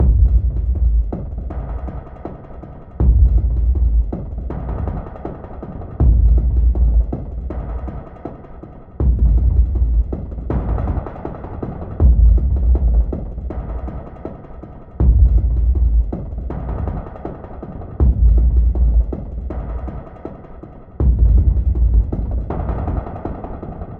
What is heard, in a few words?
Percussion Loop - 80; Depths; Ghosthack